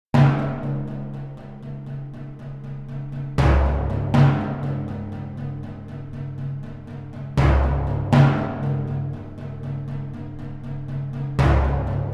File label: Timpani Suite